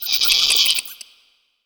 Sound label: giant rats